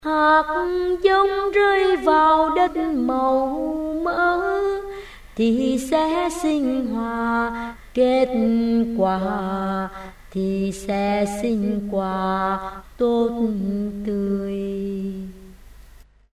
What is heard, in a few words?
alto basso